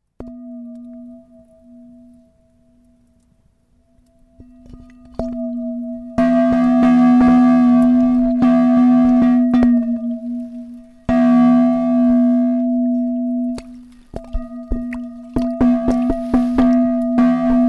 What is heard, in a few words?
copper gong dub